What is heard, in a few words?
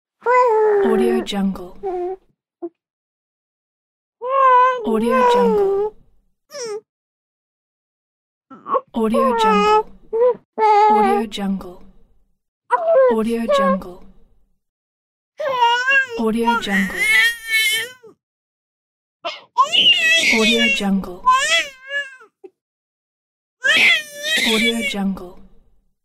baby sounds; baby sounds vol 5